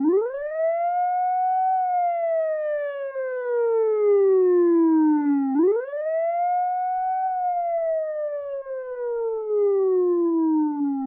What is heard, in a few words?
siren; Dr. Driving 2; Android